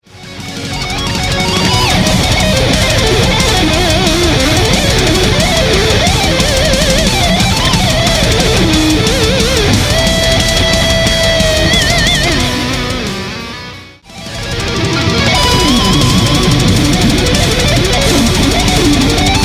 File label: Solo Excerpts